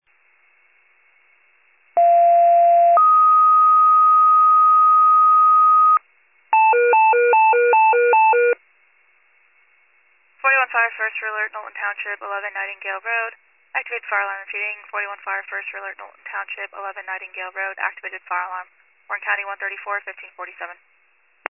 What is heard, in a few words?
fire, pager alert